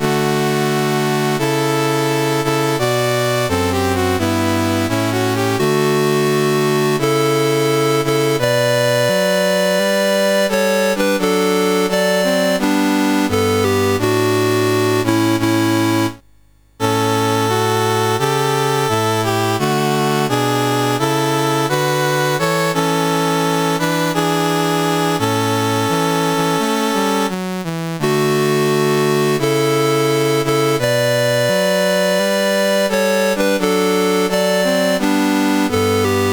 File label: Bahamas, National Anthems, CSG8580R5